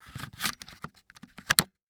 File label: Technology Electronic Camcorder Panasonic Dvx100 Placing Battery On Camera